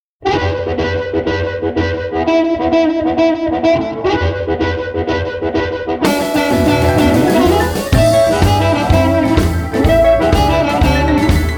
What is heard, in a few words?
Lick Train